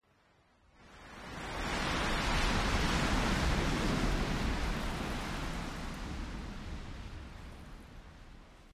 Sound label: wind buffet; modern warfare 2; amb elements